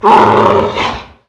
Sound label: Monster Hurt Big; Monster Ralph Hurt Big